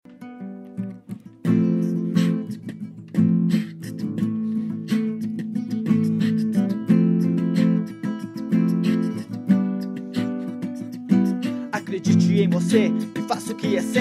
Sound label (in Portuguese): Jingle - Rap